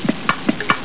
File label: oilcan